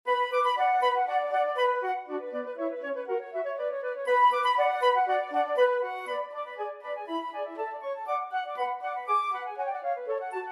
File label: badinerie flutes